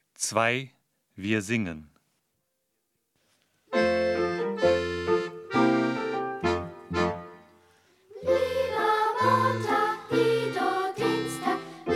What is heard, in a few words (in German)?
mi ma montag CHANT